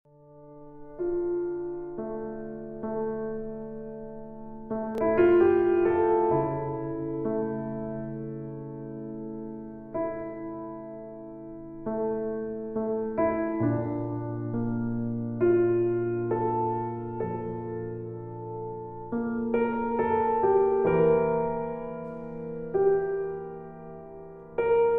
Peaceful Piano

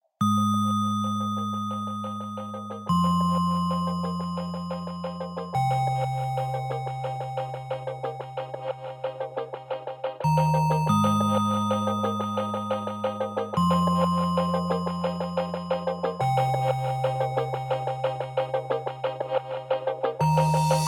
Waves Of Tension; ambient y chill out

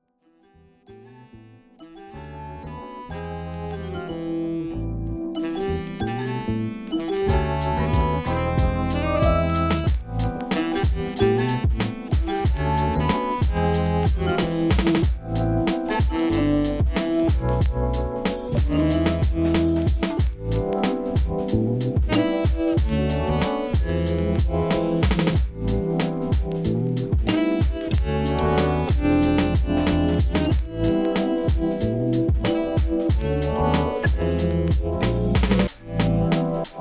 Wavy Rhodes, Soul - Jazz - Chill

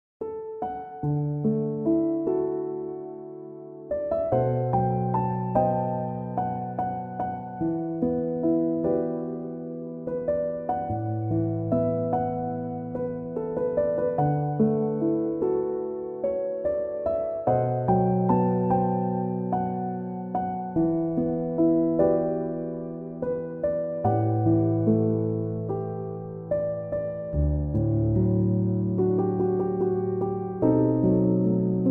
piano instrumental

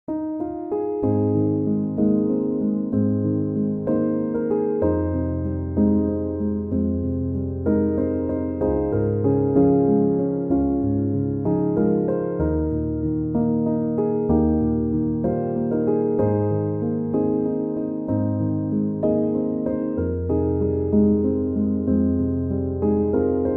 piano instrumental

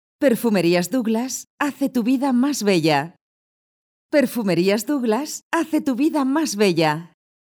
Jingle; Douglas

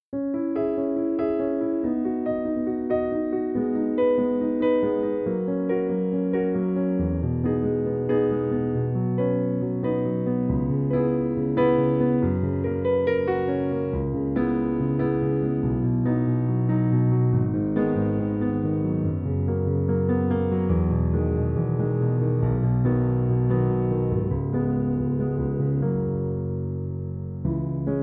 Piano Version

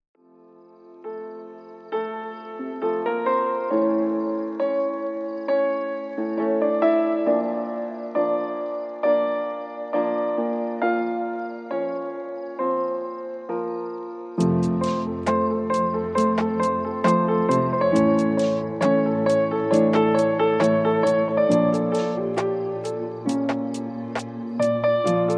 instrumental lofi

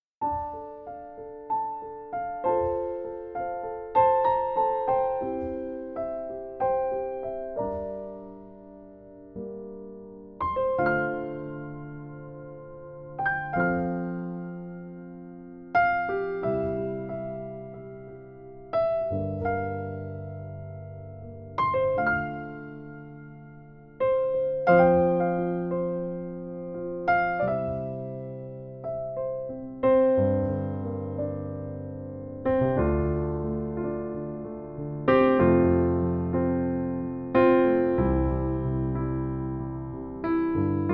Piano Version

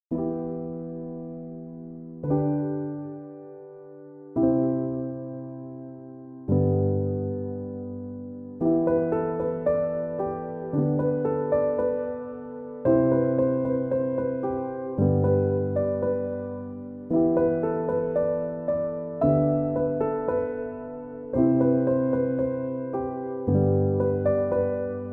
piano instrumental

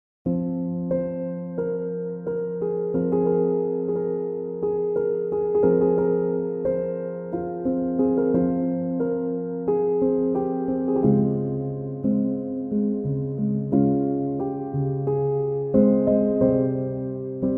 chill piano instrumental

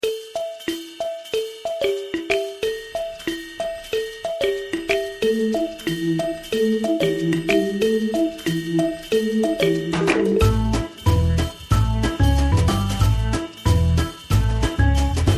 funny blues

